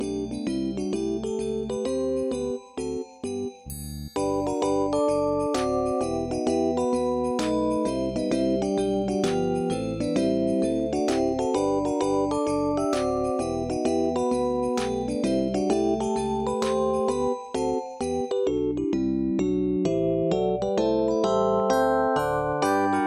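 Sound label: orchestra Only; Hear the song without any voices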